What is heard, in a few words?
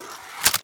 crossbow draw; weapons